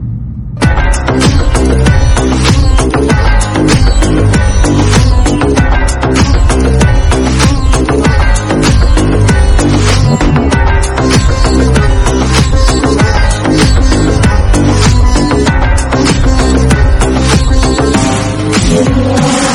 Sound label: Telugu Bgm Bass Ringtone; Dilli BGM